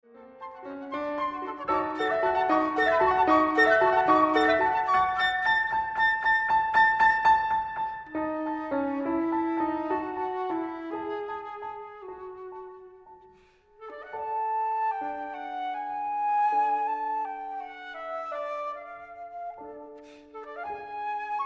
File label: Sonata For Flute And Piano In D Maj; Sergei Prokofjev